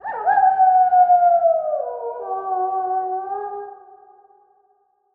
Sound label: wolf